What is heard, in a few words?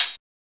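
hi hat; E-Lab; X Static Goldmine 1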